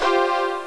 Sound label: VIOLA